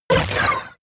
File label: sci-fi laser shot short; krieg waffen